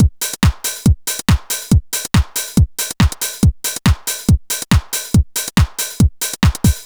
Dance Drumloop; Drums; Dance Beats

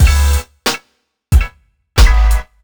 Urban Beat, Drums

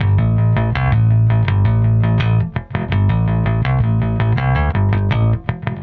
Electric Bass; Basses

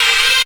CRY FX; SoundFactory; SMPMANIA; EFFECTS

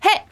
HEY; SoundFactory

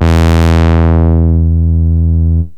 poly saw, yamaha rm1x synth bass and lead

